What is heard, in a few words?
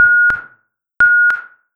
vehicle move back; Project Zomboid